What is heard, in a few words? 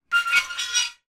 industrial metal gate lever movement